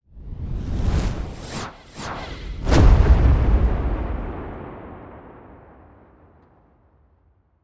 Swirling Flames Whoosh Into Thunderous Cinematic Impact; Ni Sound; Momentous Transitions